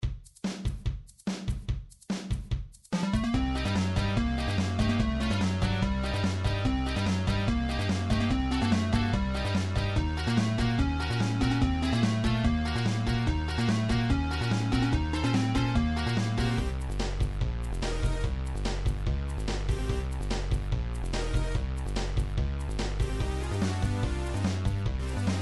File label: Super Nintendo big boss battle synthesized xg, midi; super mario rpg